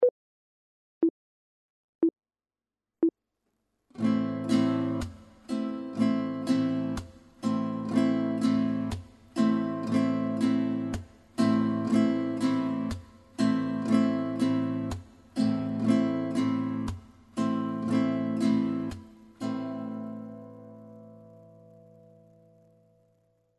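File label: chord, guitar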